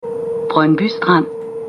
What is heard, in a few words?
brondbystrand; Brøndby Strand; 4. generations S-tog